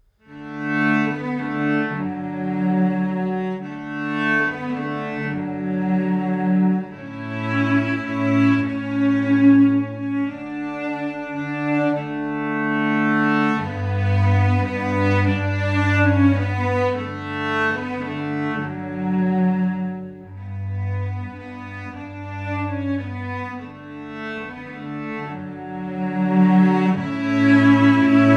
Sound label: Silent Night Cellos; Trad – Silent Night